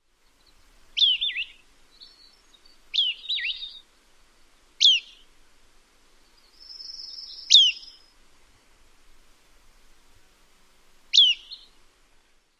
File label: calls